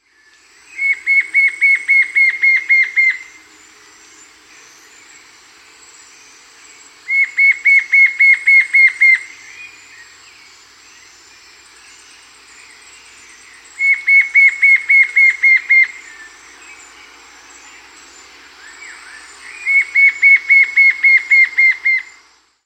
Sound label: Fringe-Backed Fire-Eye; S. America